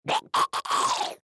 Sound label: Horror Monster Blood Choke; Blastwave